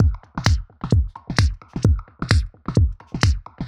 Beat filt 130bpm, uk garage

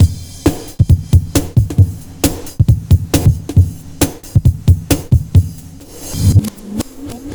LOOP FX, Big Beat Drumloops

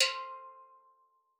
C4, STEELDRUMS; Xsample VOL-4

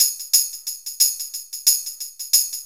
Hattentamb, Synchro Funk, Beats; Sonic Foundry (Sony Creative Software)

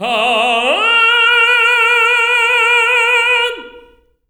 TENOR OCT A#3

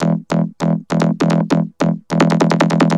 Vocobeat; Sonic Foundry (Sony Creative Software)

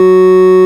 ORG, Farfisa Clarinet; Roland LCDP09 Keys of the 60s and 70s 1

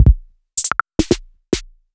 Monacor Rhythmical Choice

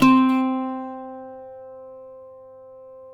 CUATRO C 4, Guitars; Spectrasonics; Hans Zimmer Guitars VOL-2

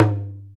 PER Percussion

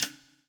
POWER RIM

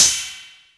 Cymbal, Yamaha DTXpress

